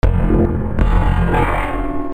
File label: RHYTHMIC